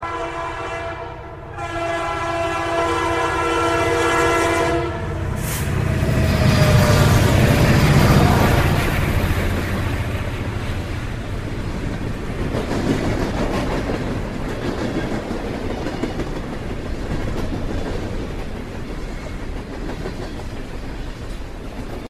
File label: Black Train Passing